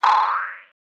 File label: vibrating boing; soundscrate